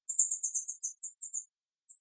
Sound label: example chirp playback call